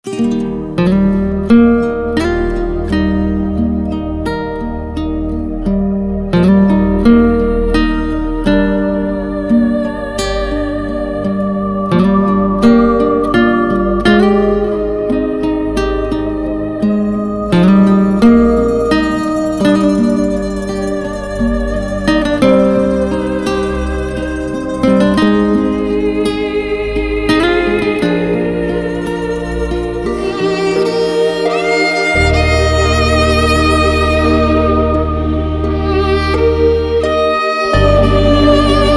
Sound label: Instrumental